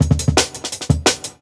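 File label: Jungle Breaks, 170 bpm; Burst; Original Jungle Breaks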